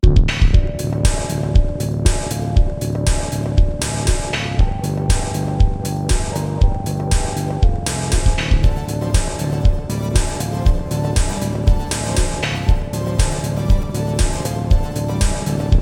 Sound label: Waters; Traktor